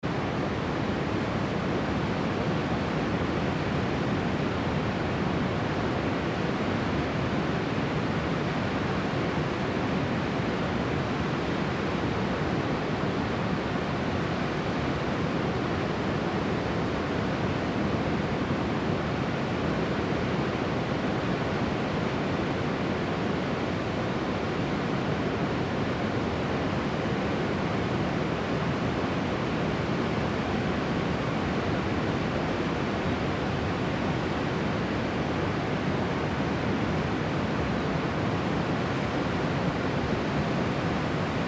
The Ocean by the Hassan II Mosque in Casablanca Morocco